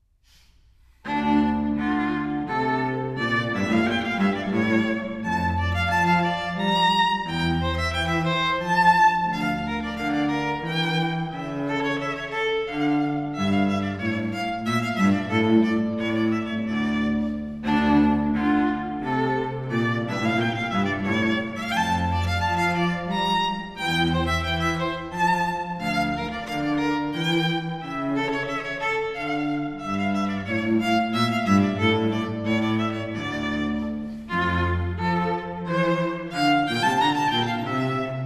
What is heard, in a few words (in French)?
rondo de Purcell violon violoncelle; the cello and the violin – two voices in perfect harmony